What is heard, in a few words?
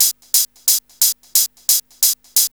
Hip Hop R n B; USB Soundscan vol.19; AKAI